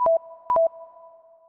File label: Low Battery